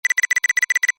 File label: Fast glitchy electronic beeps